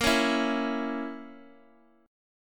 Bb Diminished Bb; strummed; JGuitar

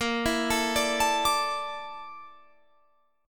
Bb Major 7th Flat 5th Bb Guitar Drop D

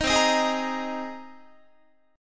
C Minor 7th Sharp 5th C Guitar

